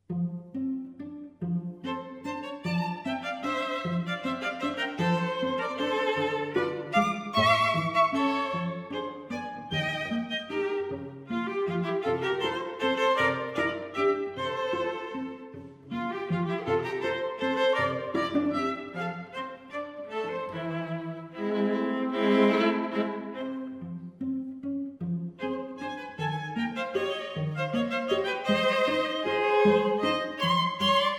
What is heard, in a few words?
string trio; andreae